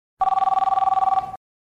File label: Stock Ring